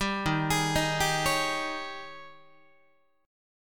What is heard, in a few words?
Eb 11th, Guitar; 10,11,11,13,11,12; 4A,4E,5A,5D,5G#,6C#; arpeggiated